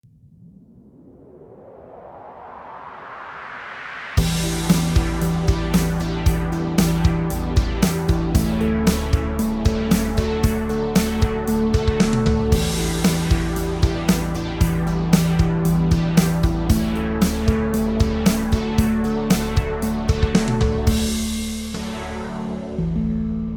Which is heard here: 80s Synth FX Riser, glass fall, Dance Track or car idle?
80s Synth FX Riser